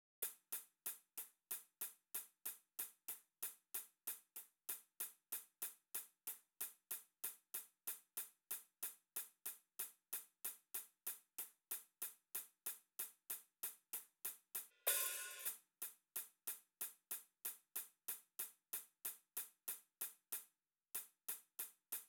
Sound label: hihat; Karnak